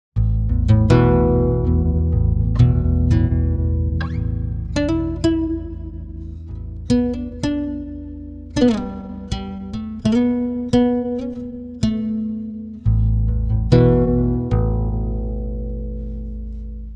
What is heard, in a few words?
guitar; izotope radius; pitchshift